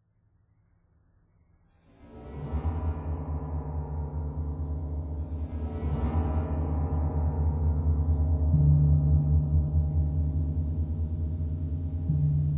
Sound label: piano thread noise